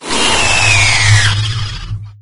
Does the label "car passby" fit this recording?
No